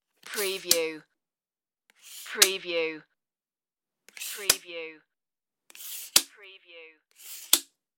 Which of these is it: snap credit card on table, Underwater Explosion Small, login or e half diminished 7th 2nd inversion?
snap credit card on table